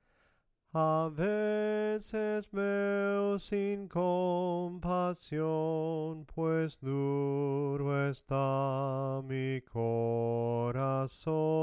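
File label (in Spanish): A VECES VEO SOPRANO CANTADO, himnos en piano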